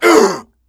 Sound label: pain, male; midnight guns; bootsector male 00